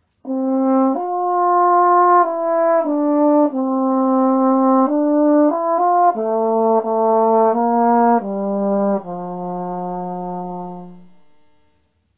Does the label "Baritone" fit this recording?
Yes